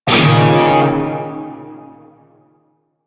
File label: Shawzin Chord; Music; Yo Chord K; Warframe; Narmer Shawzin